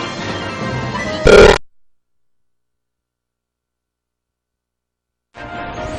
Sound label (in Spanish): Glitch de sonido; Green Greens; SSBM